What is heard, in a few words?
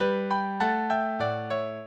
minuet, mozart